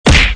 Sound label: hit